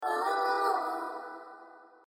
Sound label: wooho